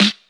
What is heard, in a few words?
sharp steel snare drum g sharp, Royality free steel snare drum sample tuned to the G# note; snare drum samples